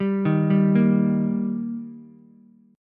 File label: D sharp Major D sharp Ukulele, chord; arpeggiated; 0,3,3,1; JGuitar